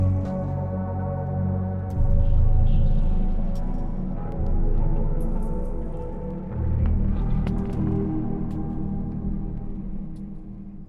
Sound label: glitched drone